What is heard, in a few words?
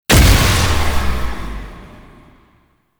Incarnon Wrist Gun Alt Charge Start; Warframe; Tenno; Weapons